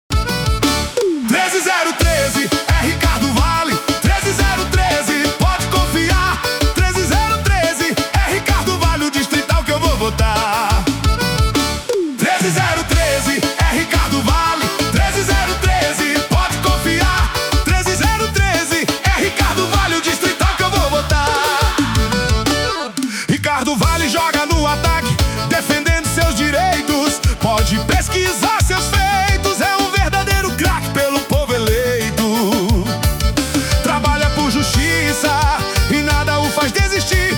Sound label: jingle